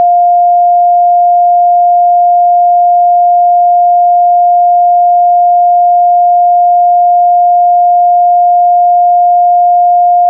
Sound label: SHEETS 703 SINE LOG